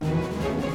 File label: Music Segments Fight Cold Theme Average 160bpm Start; asterix obelix slap them all 2